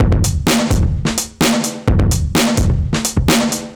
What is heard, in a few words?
Old Drums 128; musicradar